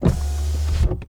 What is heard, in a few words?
servo window; soundmorph